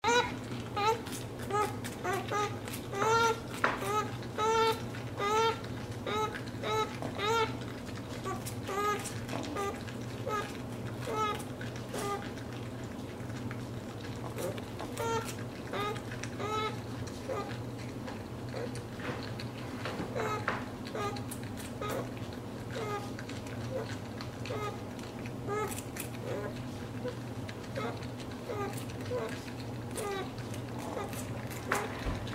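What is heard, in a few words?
beaver eating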